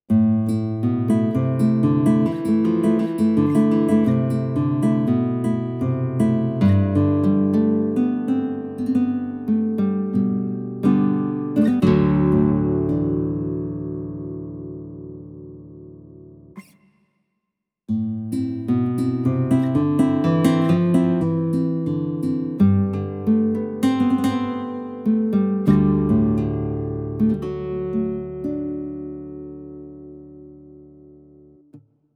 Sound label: guitar nylon, Yamaha tyros3